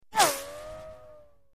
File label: Left To Right Tonal Bu, bullet bys